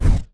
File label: shoot mystic; Epic Toon FX